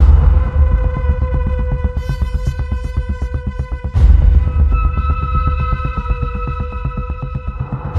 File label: string flutter light; transformers war for cybertron gamerip; L2 SENTINELS DEAD